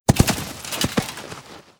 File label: Armored Warhorse No Vox Death Underlay